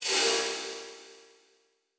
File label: Title Transition Metallic; Adobe; Production Element